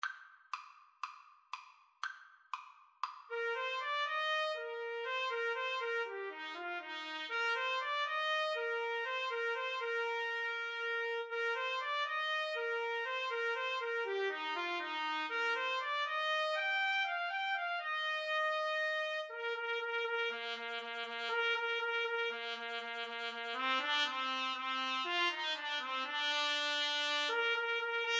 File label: baltimore belle 2 trumpet